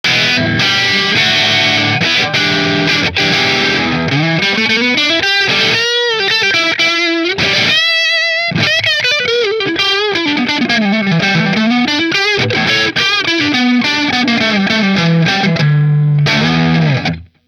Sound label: purple middle marshall; Mcnaught